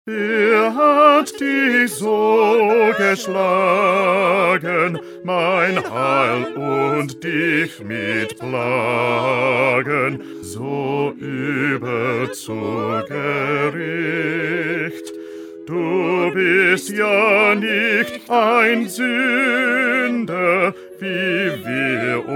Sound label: Bass; Bach-St-John-Passion-11